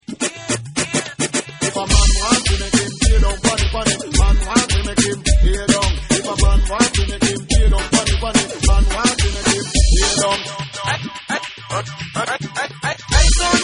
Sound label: Retro Reggae Mixdown